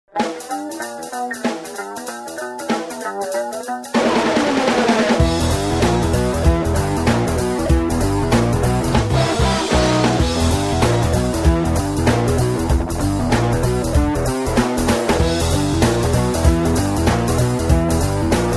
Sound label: Rock